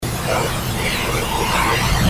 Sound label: Piano Room